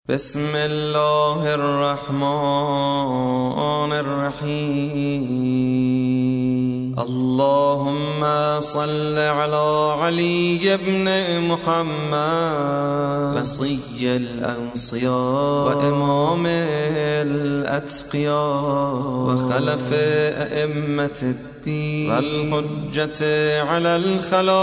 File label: salavat; dua; makhsos